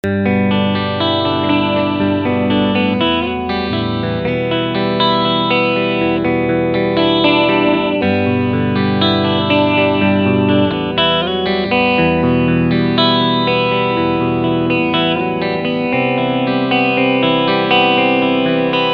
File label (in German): Beide Pickups Clean Picking